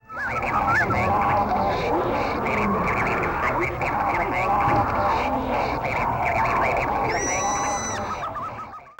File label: Jungle